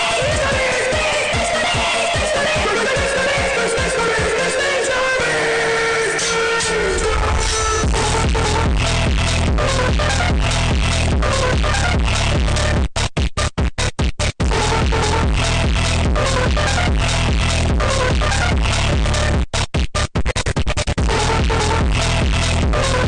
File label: phonk